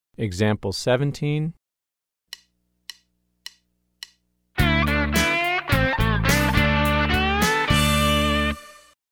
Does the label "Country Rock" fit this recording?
Yes